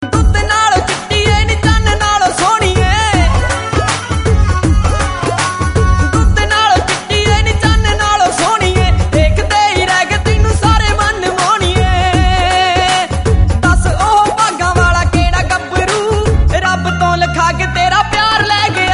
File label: Punjabi Song